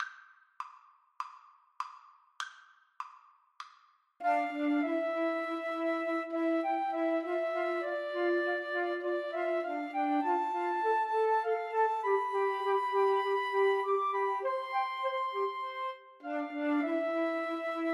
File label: chabrier idylle flute trio